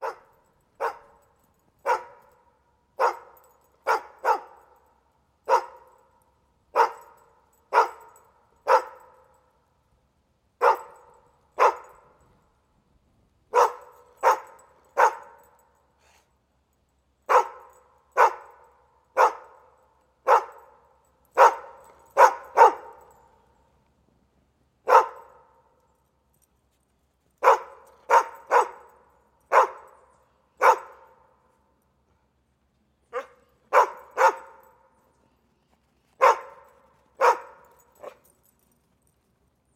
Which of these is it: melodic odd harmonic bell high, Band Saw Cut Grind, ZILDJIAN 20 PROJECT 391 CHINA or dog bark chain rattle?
dog bark chain rattle